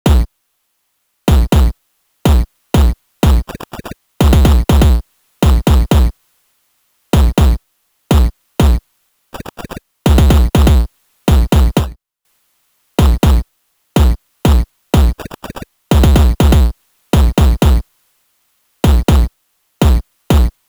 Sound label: kicks